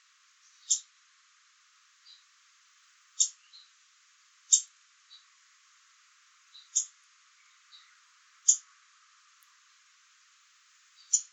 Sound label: Ovenbird Seiurus aurocapilla; warblers